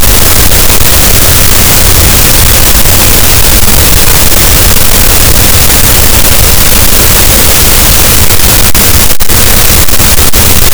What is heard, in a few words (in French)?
cachalot, Physeter Macrocephalus, gaussian noise